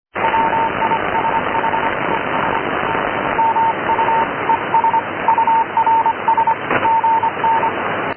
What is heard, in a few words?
noise cw